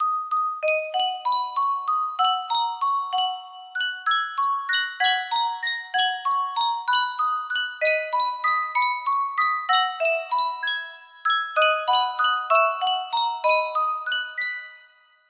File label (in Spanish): Yunsheng caja de musica, music box melody